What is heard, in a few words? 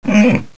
UNDO